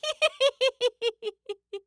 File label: Horror Scary Human Female Laugh Witch Laugh